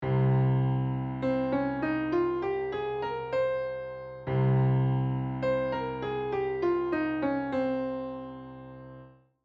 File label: modes phrygian